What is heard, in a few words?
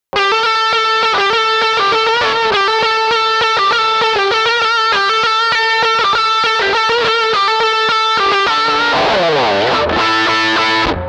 Strat Mesa Studio Pre